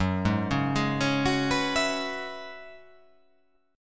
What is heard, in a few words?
F sharp 7th Suspended 2nd Suspended 4th F sharp down